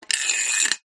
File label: small metal object coin slide inside metal pole; foley